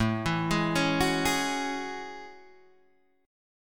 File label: A Major 13th, Guitar DADGAD